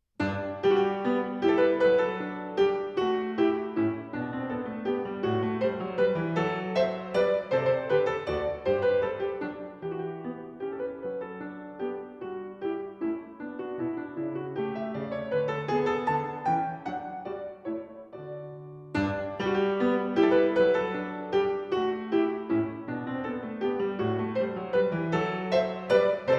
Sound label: Passepied; Partita no. 5 in G major, BWV 829